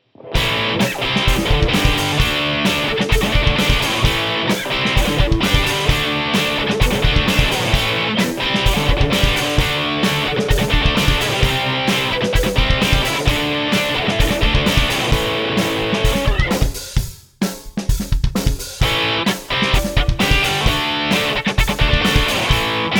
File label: fuzz tortion; GNI Fuzz Tortion